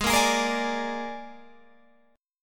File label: Ab Suspended 2nd Flat 5th Ab Guitar Open G